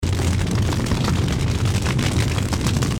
firebolt projectile; abilities

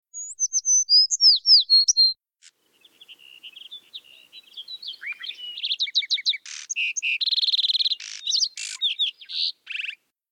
birdhouse

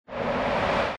cyroblast cast; abilities

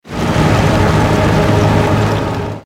morass effect

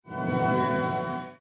complete quest

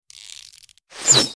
bow autoattack cast; abilities

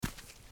biped run

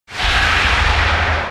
cyroblast effect; abilities

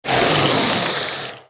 horde chillkin death; mobs

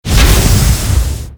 cauldron

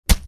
impact blunt

